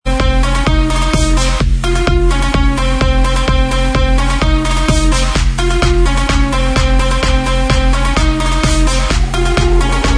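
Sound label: instrumental, backing track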